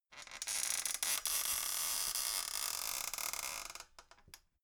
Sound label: folding chair creak